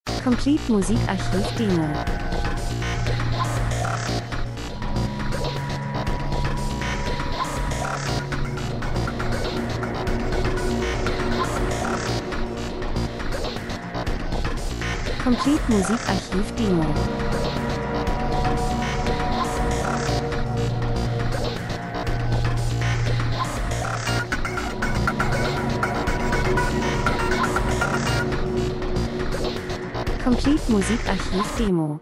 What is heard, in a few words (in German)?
rhythmus; Elektro Percussion Spannung Krimi Höhepunkt Mörder Tatort nachdenklich Nacht dunkel Natur Dokumentation; Stimmung; drums